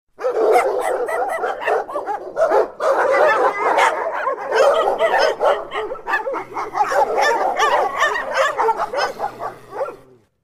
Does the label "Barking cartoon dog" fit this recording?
Yes